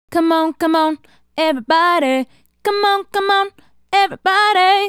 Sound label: Female Solo, Hip-Hop and RnB Vocals